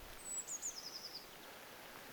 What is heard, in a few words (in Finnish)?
sinitiaisen laulusae